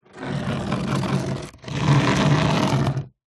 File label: household chair plastic patio drag concrete